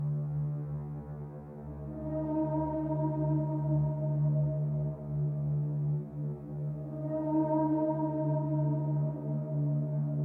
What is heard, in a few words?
siren